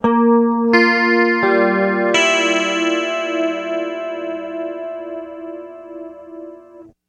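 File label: clean guitar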